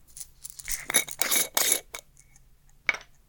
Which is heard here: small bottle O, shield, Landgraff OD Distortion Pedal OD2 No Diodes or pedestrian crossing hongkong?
small bottle O